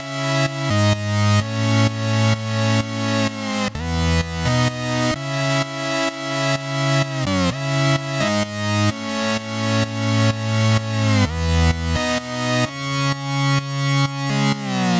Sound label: synth chords, Key A Bpm 128; Loop Nerds; Big Room House Allstarz